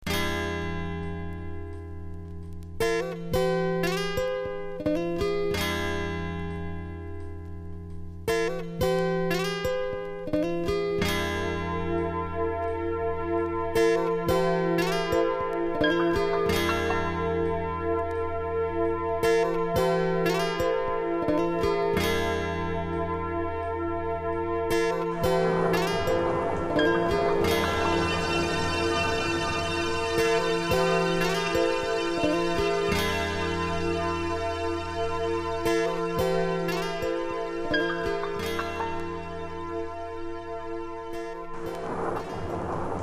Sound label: Live, Jungletrain